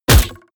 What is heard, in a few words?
crossbow hit generic